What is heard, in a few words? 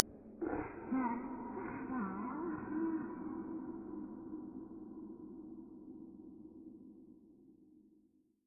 Laugh